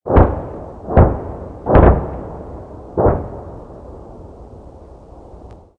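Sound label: explosion distant; modern warfare 2; amb elements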